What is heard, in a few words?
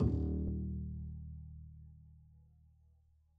pizz eb1, double bass; dsmolken